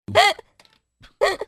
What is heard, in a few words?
Laugh